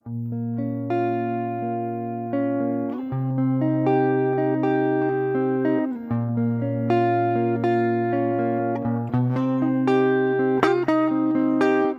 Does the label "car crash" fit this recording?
No